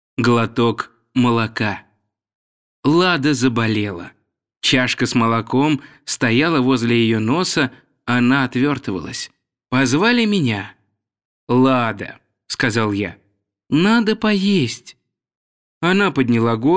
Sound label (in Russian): glotok moloka